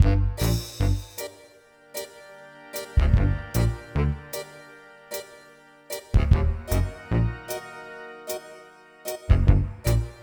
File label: Social Hub Ambient Low